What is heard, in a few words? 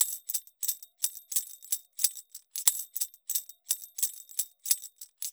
ETHNO EXTRA 90; ethno2